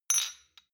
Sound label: shot glass clink bounce medium; soundpool